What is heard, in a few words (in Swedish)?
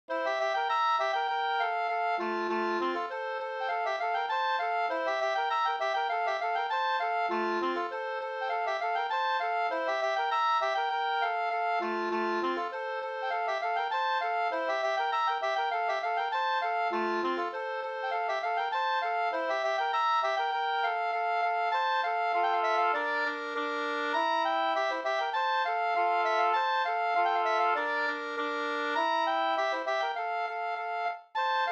engelska, F dur; fr Stigtomta